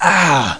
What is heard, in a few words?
pain; Alien Arena; hunter